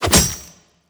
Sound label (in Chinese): 重攻击命中; 音效; 战斗